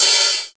soft sounding open hi hat d key, Royality free open hi hat sample tuned to the D note